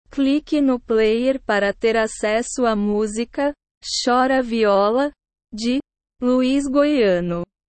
chora viola

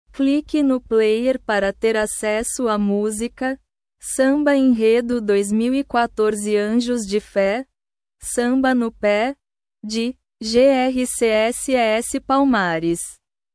samba enredo

